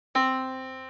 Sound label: yamaha Disk